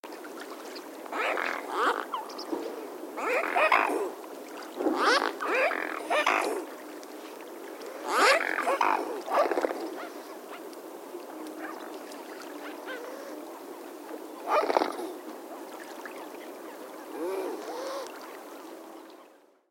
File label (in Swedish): Knölsvan